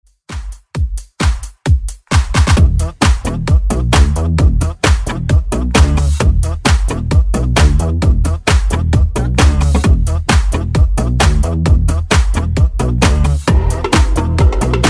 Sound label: Dance Ext Redrum